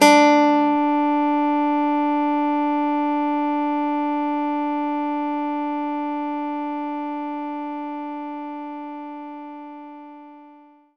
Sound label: Nylon Guitar D3